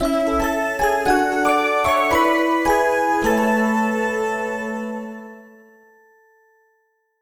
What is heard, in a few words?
Bgm mission clear